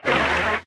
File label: arm extend, asteroid collector; Factorio